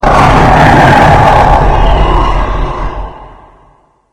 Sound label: hk chatter; slender; hellknight